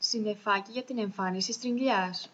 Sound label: scream balloon; tuxpaint-stamps; symbols; shapes